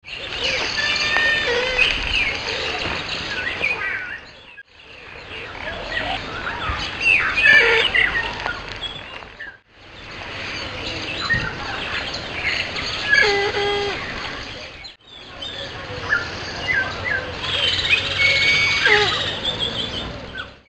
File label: giant eagle owl, juv, Lk Baringo